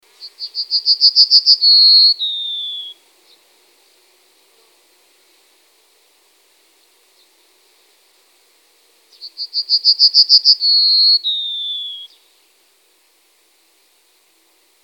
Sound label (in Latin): Emberiza citrinella